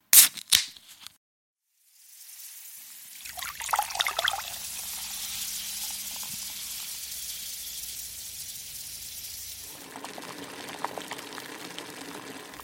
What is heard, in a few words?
cooking ambience